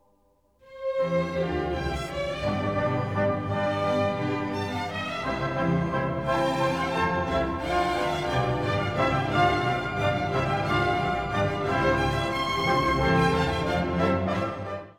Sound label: Christe Merry Orch